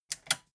metal button click power off fast

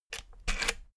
rotary control plastic rattle turn

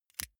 lighter electric switch flame on gas

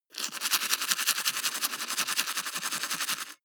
teeth brush wet open front fast

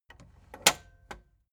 tape deck close soft

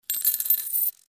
money noise

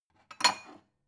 glass take soft

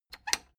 plastic button latch squeak click disable fast

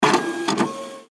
fax machine

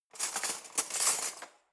cutlery knives drawer handle

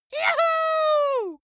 dwarf cheer "juhu"

